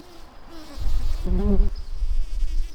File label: bumblebees, Hypnorum workers